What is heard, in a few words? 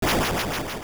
Nightmare Shadow Bat; Fourth form launching magic bats; Ganon